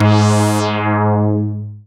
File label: SYN Resotron; Best Service Gigapack 1